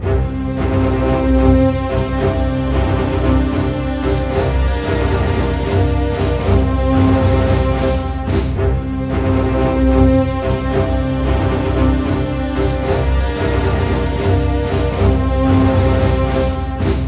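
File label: 112 war, orchestra; East West; Scoring Tools; War Status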